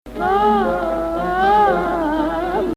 Hijaz, maqam

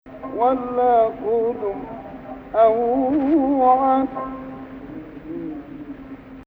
2nd Rast, maqam

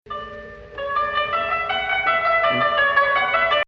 Rast Ajam Octave

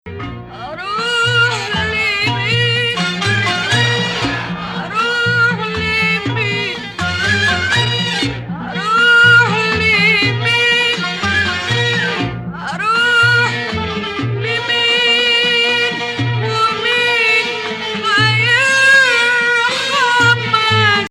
2nd Rast, maqam

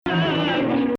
Rast, maqam

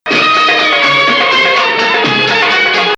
Hijaz, maqam

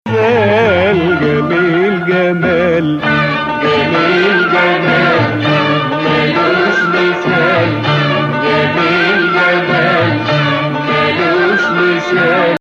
Nakriz, maqam

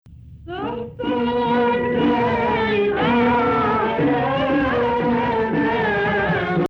2nd Rast, maqam

